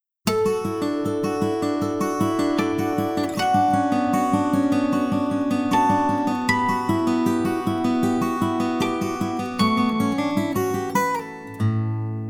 Acoustic Delights

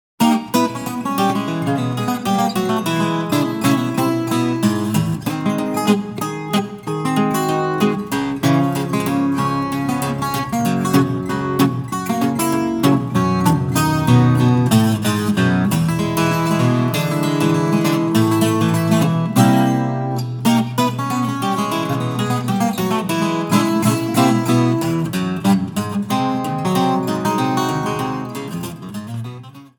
12 String Rag

